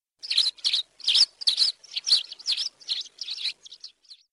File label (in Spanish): lavandera blanca